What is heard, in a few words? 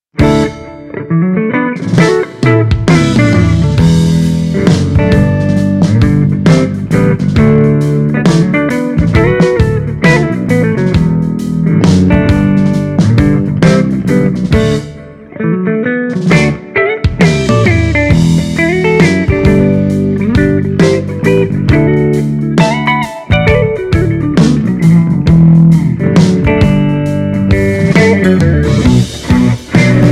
Mini Rox Mic Inside, Les Paul; CANNABIS REX